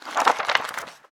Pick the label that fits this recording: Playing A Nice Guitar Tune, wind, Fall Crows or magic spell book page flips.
magic spell book page flips